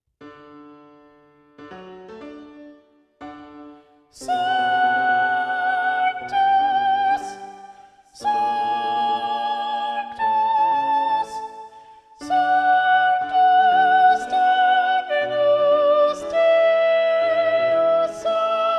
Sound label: Soprano; Mass No2 in G Mvt 4 Sanctus; Practice Room